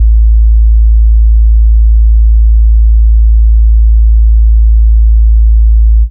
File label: PURE 55HZ SUB, bass